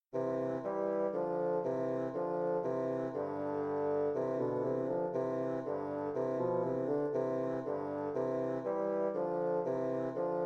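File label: rondo bassoon duet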